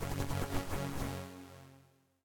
fs Symbol Wheelstop; netent; neonstaxx

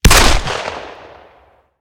Police H Gun; netent; narcos

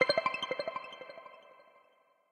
count Up End; netent; doublestacks

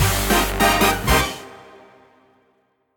big Win End; piggyriches

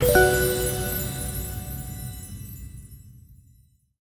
win Small; netent; wishmasteroct

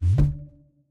reel Bounce; netent; doublestacks

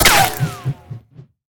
drive By Impact Spin; netent; narcos

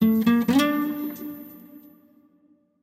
small Win; narcos

